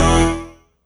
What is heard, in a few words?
Synth Hit E